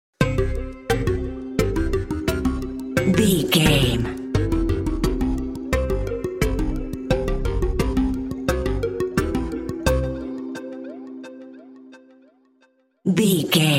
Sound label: plucky curiosity bumper